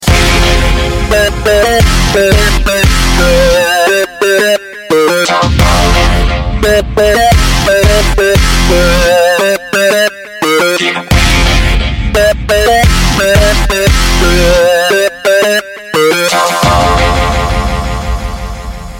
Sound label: ringtone; reggae